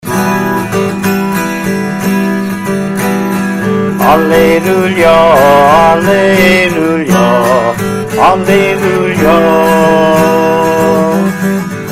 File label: Gospel Acclamation Easter; SMMC Service Music; Lent - Easter